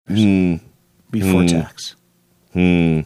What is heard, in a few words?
"hmm hmm hmm"